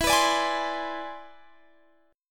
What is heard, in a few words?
E 7th Flat 13th; E7b13; strummed